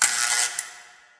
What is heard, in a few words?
light flicker; Source Engine; Portal 2